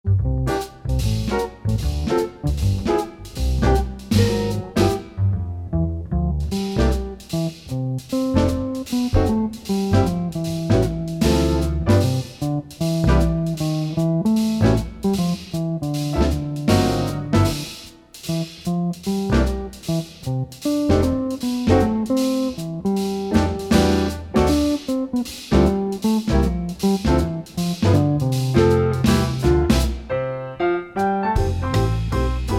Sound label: Instrumental